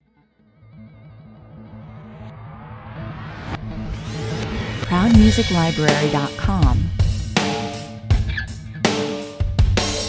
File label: heavy rock 162 bpm